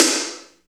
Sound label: SNR, Tekno Industrial; East West